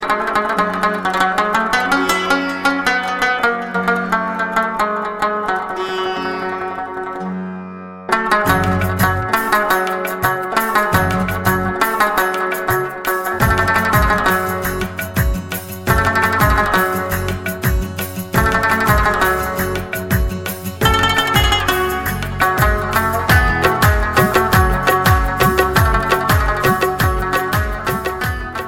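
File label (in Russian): afganskaya muzika, Afghan music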